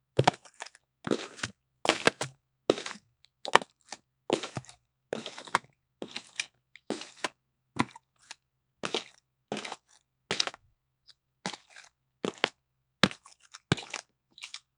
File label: fast footsteps